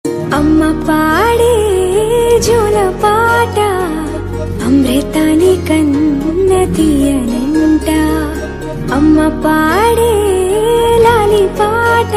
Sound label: amma paata ringtone, Telugu; mittapalli studio